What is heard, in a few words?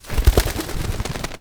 Bird Three Wing Flap; Ambience; Warframe; Entrati